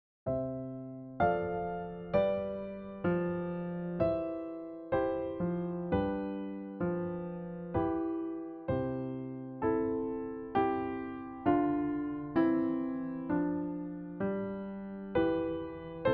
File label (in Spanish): Posiciones de los acordes